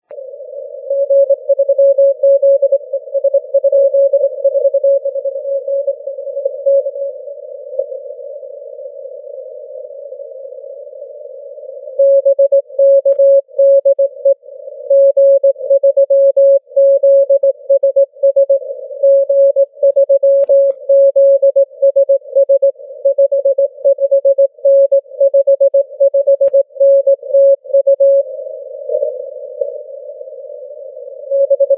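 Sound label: 80m continuous wave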